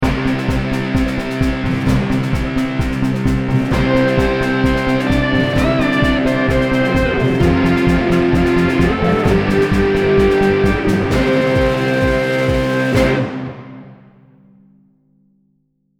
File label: no synth